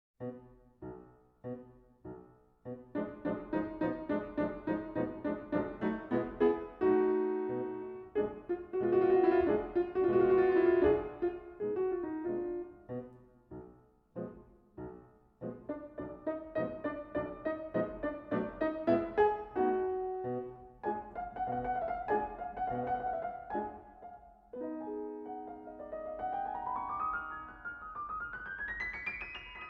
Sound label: danza sacra e deutto finale; verdi; aida